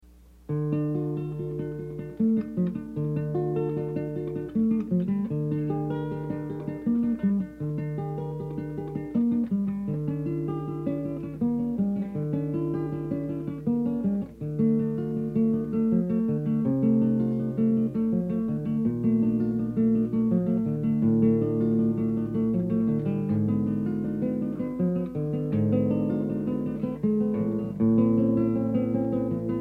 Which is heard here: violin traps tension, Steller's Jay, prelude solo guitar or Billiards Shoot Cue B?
prelude solo guitar